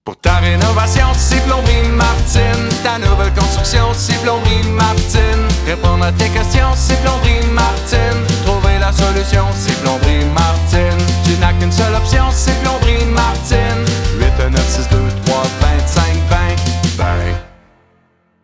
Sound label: jingle